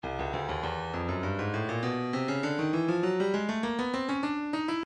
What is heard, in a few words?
breakbeat midi 100 bpm; Stylus; Bing Bang